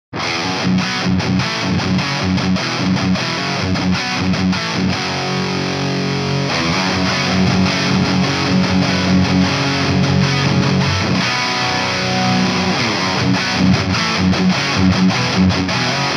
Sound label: Bucker LP Crunch; MagFrag-Riot